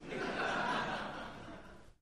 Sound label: Crowd 100 Short Medium, medium int